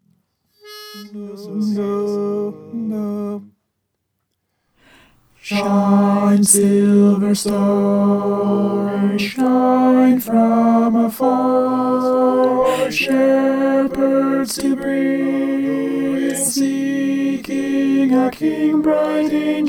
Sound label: alto